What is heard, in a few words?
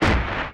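punch distorted trash dirty kick; lo-fi digital 8-bit glitch fx; nova sound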